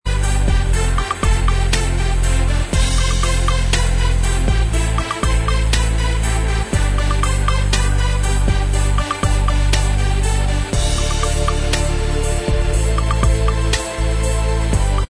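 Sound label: instrumental, backing tracks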